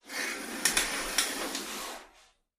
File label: shower curtain; curtains